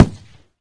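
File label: short sniper rifle shot sound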